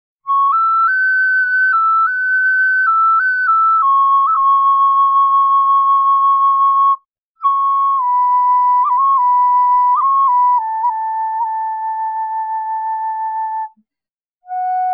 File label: Small F sharp Western Cedar, flutes; High